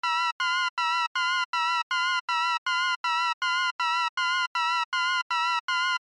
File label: Lead; PatchFactory